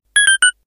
item drop; coffee crisis

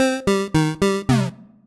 mus life; Musica i so amb SDL Mixer

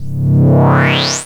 logo; orni attack

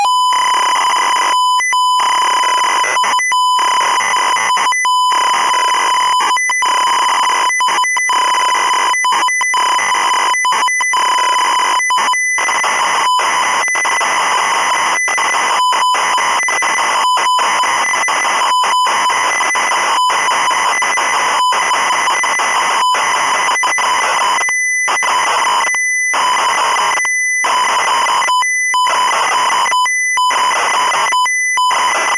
loading sound

